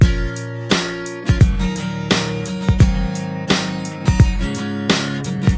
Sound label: song; kz-endo slide svn muffinslides